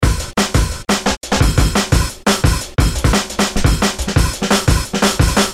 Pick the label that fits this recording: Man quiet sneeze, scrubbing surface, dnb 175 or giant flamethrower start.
dnb 175